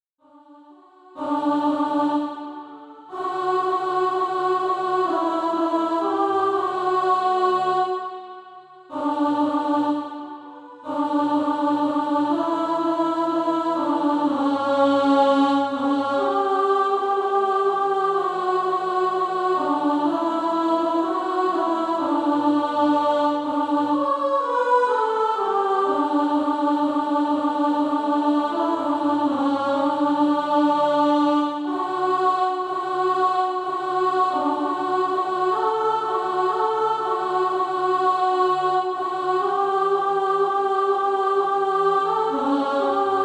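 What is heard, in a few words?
alto, Practise Music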